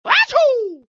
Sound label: achaaaah; street fighter alpha